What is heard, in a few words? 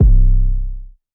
808 (C); Inbound; Cymatics; GHOST (Beta Pack)